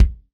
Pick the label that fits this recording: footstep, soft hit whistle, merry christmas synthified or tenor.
soft hit whistle